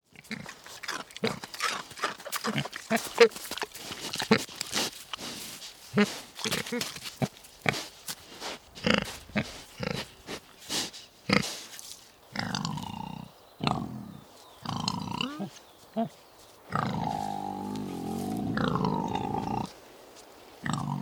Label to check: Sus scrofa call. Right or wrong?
Right